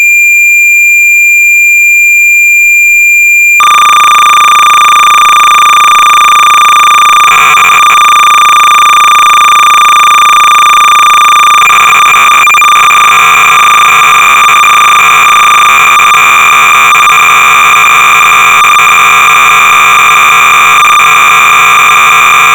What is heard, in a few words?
BASIC Sorcerer 300 baud; Kaleidoscope